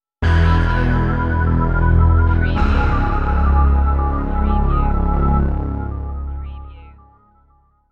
Scifi Audio Logo Ident, Serious, Two Notes, Digital